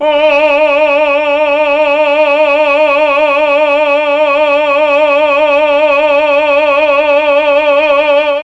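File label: D3 chamber male, mellotron; CHMB MALE VC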